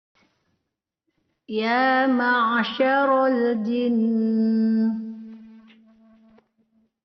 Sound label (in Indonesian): Perekaman baru Karaoke denoised; cara waqof; cara waqaf